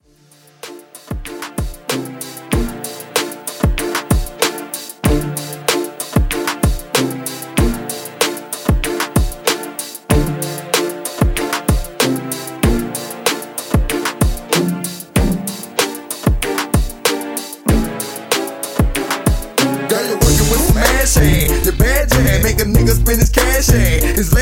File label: In Outro Edit 95Bpm Dirty